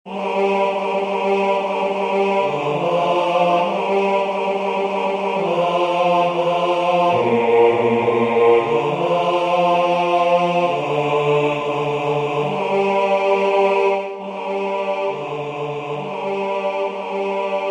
Viens Esprit de sainteté basse

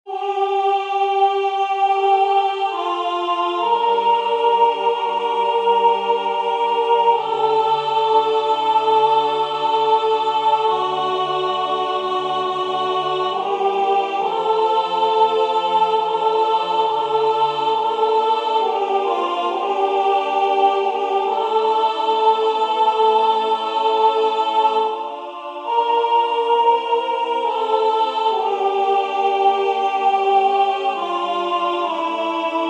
soprane; Christus factus est; Anerio

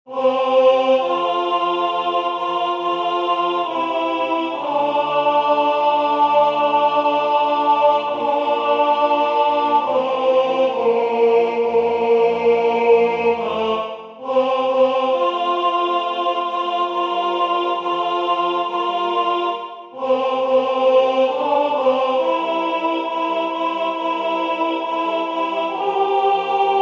Je vous salue Marie alto

